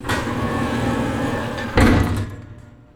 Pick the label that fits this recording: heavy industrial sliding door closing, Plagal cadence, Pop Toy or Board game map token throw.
heavy industrial sliding door closing